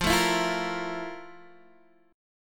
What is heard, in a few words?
F Major 11th Guitar Half Step Down